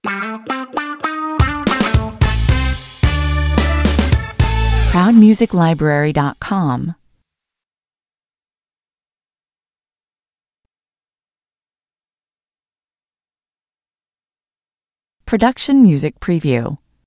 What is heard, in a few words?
stinger